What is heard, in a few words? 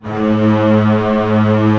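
STR D#1 FF, strings; Sound On Sound - Peter Siedleczek's Orchestra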